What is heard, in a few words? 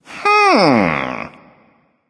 thinking; smite; voicepack; loki